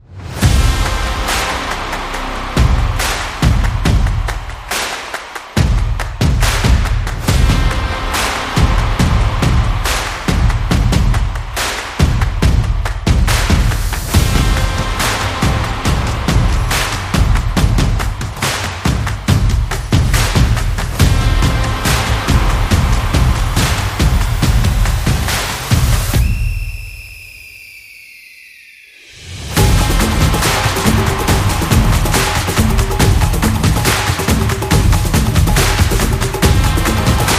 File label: Soccer, high-energy background music